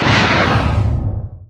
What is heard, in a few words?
flux cast; arc warden